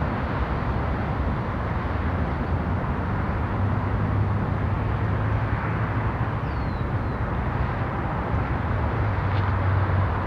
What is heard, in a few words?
city; firefight